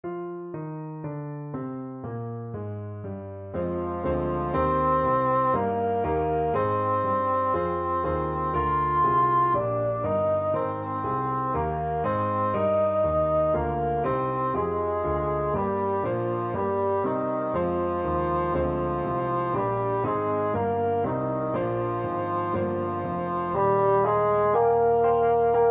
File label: personet hodie, bassoon